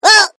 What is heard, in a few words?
Created Creature Small Woah, Alien Sound Effects